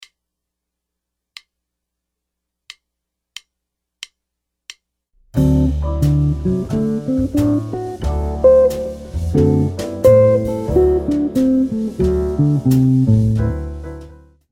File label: arpeggios, guitar